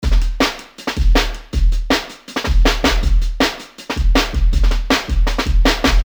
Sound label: Breaks (160 BPM); Campus; JUNGLEJUICE